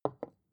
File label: glass put down wobble light